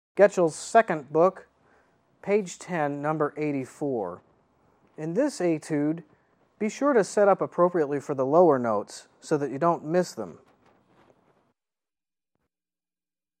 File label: Horn; Set II - Horn 5. Page 10, no. 84